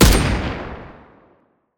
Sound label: heavy sniper